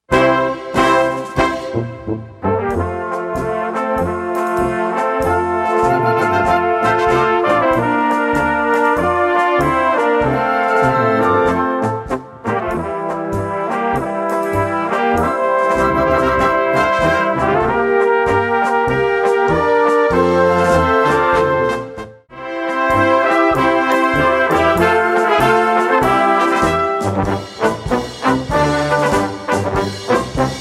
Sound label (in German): Freundschaftspolka